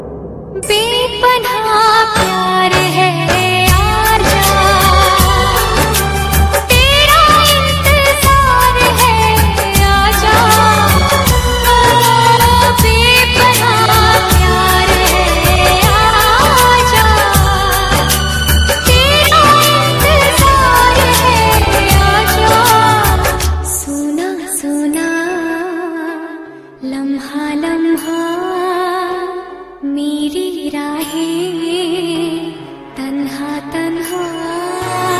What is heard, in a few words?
Classical Bollywood